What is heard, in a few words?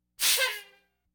Laugh tracks